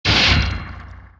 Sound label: greater cleave; sven